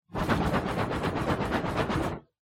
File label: Uchiwa Fan